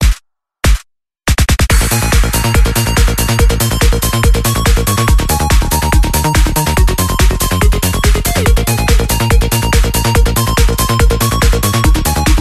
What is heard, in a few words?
Trance